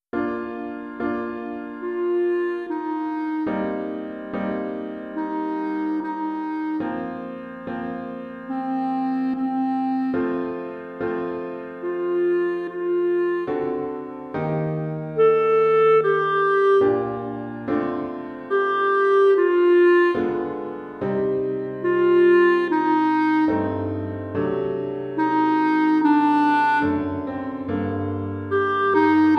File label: Clarinet Piano